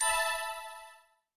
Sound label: Tile Tap; UI